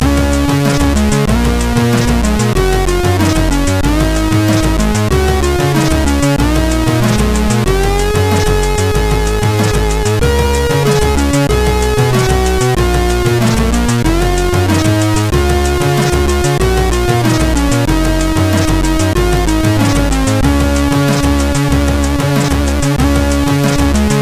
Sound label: Zene,sid CSG8580R5